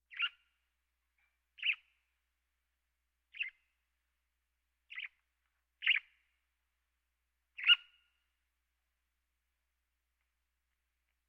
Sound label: Birds